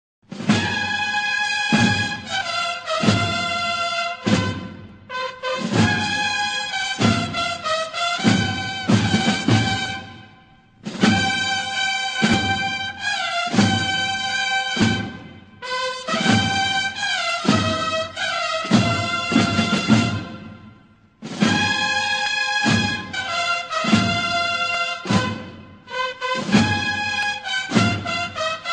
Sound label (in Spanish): Marcha Solea, Marchas de Malaga